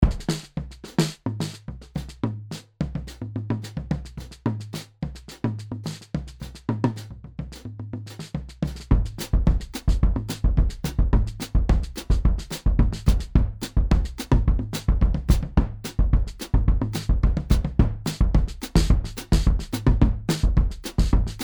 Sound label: Drums vintage Dry; Addictive